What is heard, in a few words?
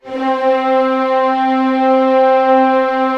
orchestra C3 FF; Sound On Sound - Peter Siedleczek's Orchestra